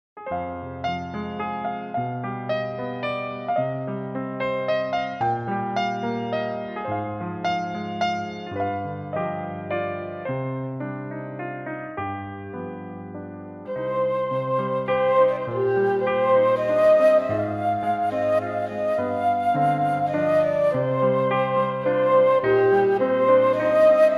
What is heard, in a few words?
piano flute